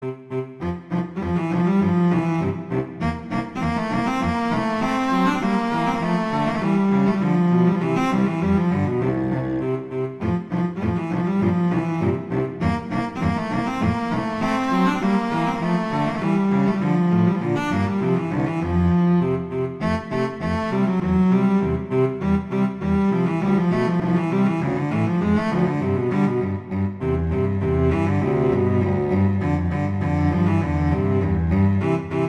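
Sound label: Duet, Rondo Allegretto, viola; Mazas; Duets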